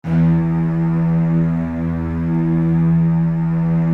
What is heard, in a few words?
Virtuoso Strings, E1; ILIO; Kirk Hunter Virtuoso Strings VOL-2; 90 s Sample CDs